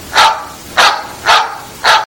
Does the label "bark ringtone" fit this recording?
Yes